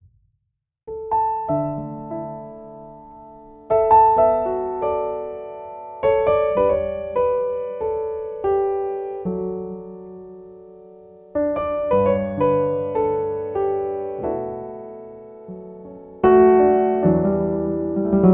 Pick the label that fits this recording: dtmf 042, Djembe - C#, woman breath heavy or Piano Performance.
Piano Performance